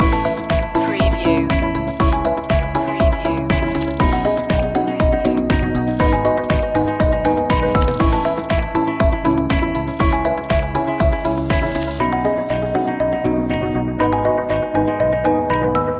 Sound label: musical notes loop; media music now